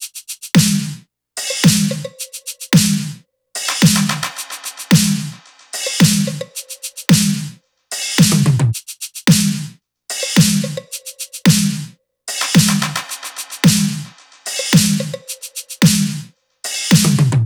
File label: top, Drum Loops, Top Loops, 110; SD MIDNIGHT Sample Pack; Slate